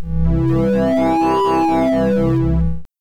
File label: softsync b, phaseshaping